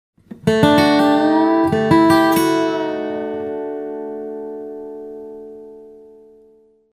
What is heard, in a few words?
Split Pedal Licks